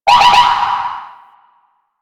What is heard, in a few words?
Space Ship Rocket Projectile Loop; Warframe; Grineer Pursuit Space Ship